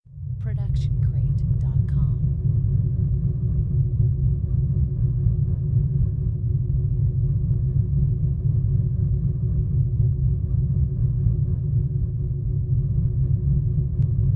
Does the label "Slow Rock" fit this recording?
No